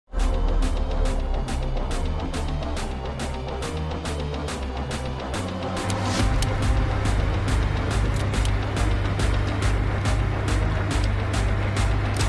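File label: background music ringtone; Mirzapur 2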